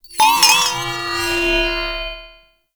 Follie Enemy Movement; Warframe

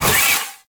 Kick Whoosh

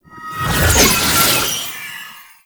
Summon Rune Cast; Warframe; Uriel; Vythelas

